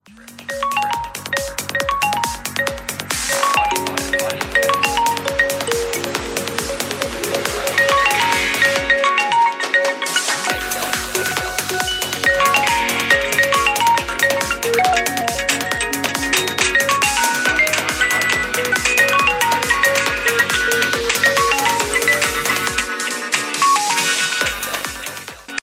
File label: Vivo Y73s